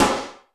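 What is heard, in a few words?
GATE SNARE; Crypton - DrumBase5000; GATE KITS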